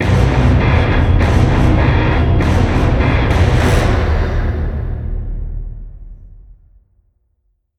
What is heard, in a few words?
Menu Victory